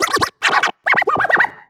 Vocal Scratches 140BPM

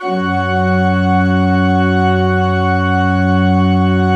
F1, Cathedral Organ

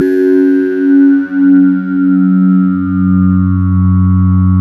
SYN SCANNE, SYN Microwave; Sound & Vision - Gigapack I CD 2

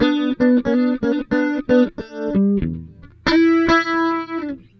Blues, Electric & Acoustic Guitar Loops; USB Soundscan vol.04; AKAI

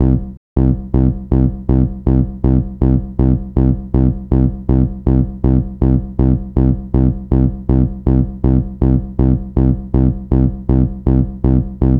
gro 160, Hardcore Loops, Bass Loops; Dance Synths

